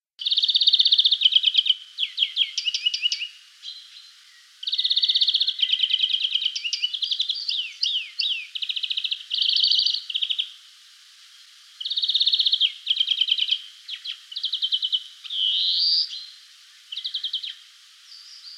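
obyknovennaya zelenushka golos, European greenfinch voice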